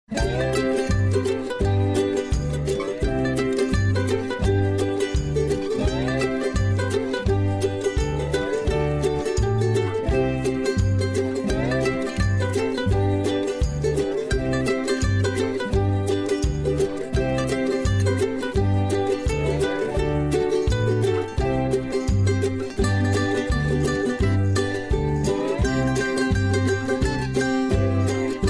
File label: music; game downhill